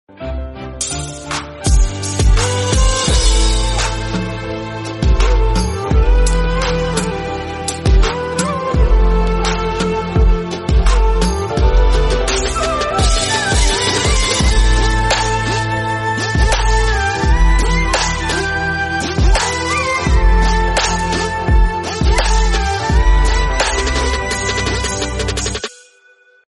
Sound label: awesome background music tone